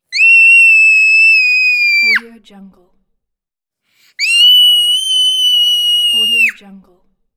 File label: child screaming